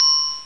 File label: Glockenspiel (C4); X-Wing Demo; X-Wing Patches